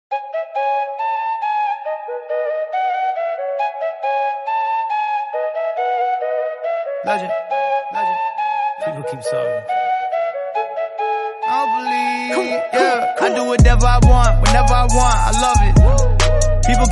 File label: Flute Song, ringtone